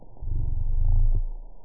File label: Growl, fish